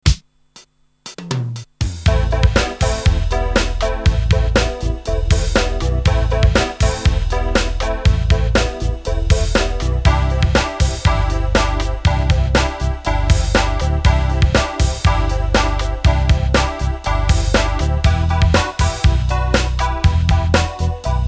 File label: rhythm